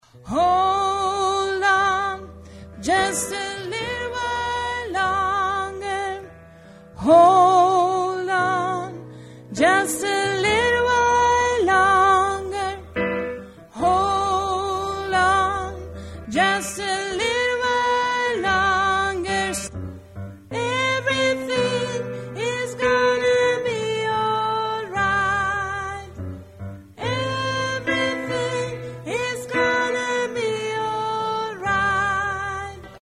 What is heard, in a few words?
tenor